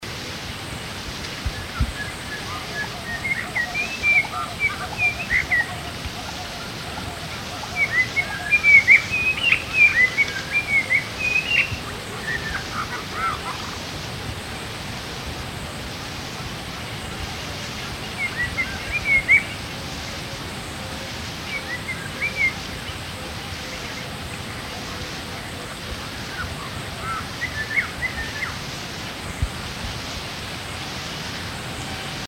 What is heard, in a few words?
white crowned robin chat